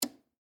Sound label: Television Set, Technology, Television, Set, Change, Uhf, Channel, Black, And, White, Tv